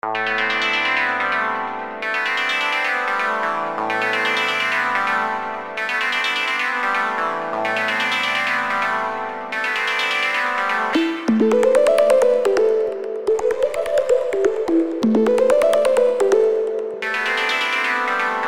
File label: Melodic Tech Voices Analog Piano; Vengeance Sound Avenger 2